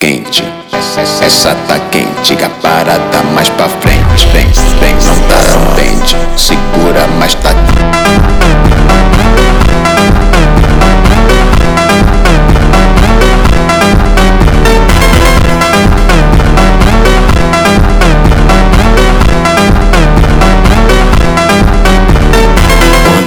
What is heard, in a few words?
funk